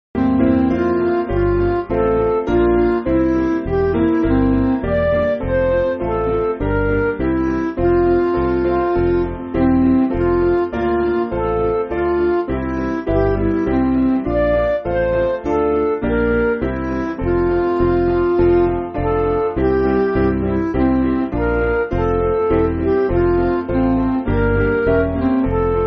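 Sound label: Midi, Backing Files for Churches; A Man There Lived; Tyrolese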